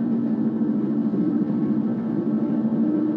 corvette hum; Stellaris

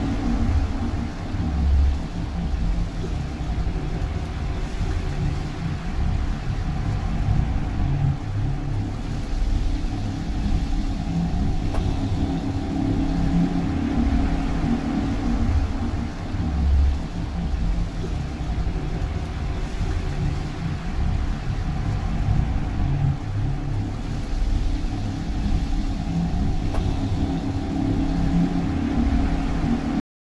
ship juggernaut idle; Stellaris; machineage

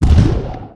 flak weapon fire; weapons; Stellaris

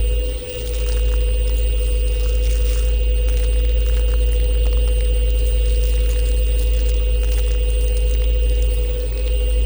ship harbinger idle; ships; biogenesis

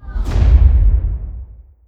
bombardment explosion; weapons; Stellaris

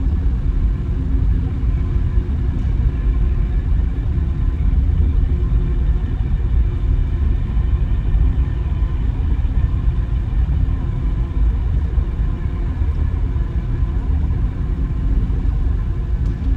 ambient transport ship hum; Stellaris

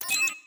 click; Stellaris; assign leader

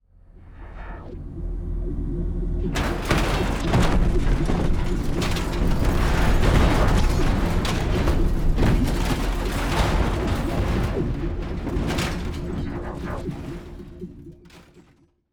magnetic metal; Stellaris; strange worlds

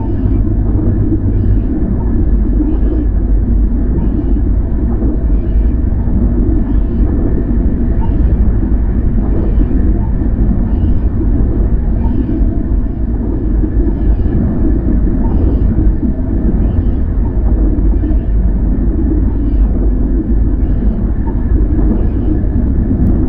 fungoid colossus idle; apocalypse

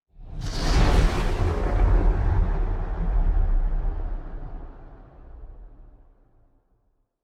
ship colossus move; Stellaris; machineage

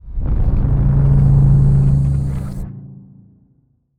juggernaut necroid move; Stellaris; necroids

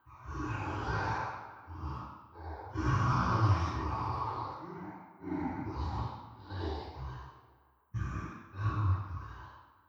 incoming message; Stellaris

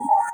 menu slide open; Stellaris

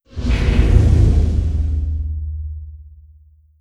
distance planet killer fire; apocalypse

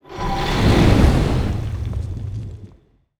necroid moving out science; Stellaris; necroids

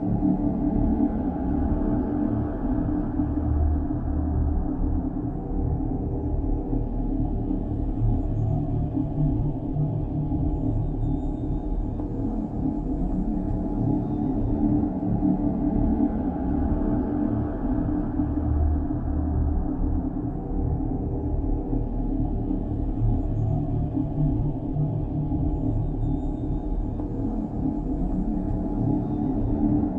ship cruiser idle; Stellaris; machineage

